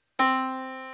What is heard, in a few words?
Yamaha Disk, piano sound